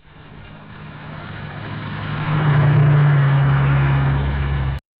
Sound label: car passing by; Stability AI